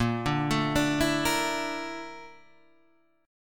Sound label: A sharp Major 7th Flat 5th, Guitar, DADGAD